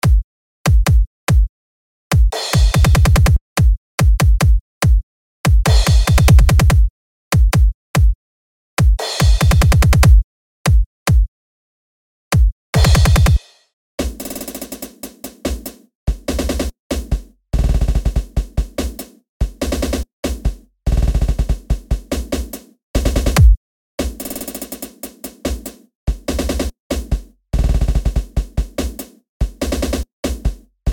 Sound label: BEST BEATZ